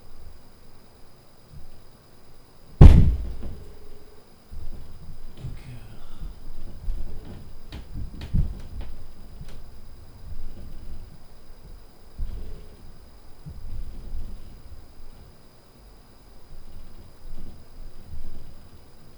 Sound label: Heavy Drop + Comment